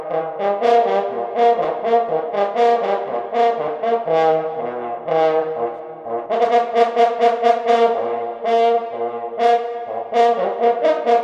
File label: Bass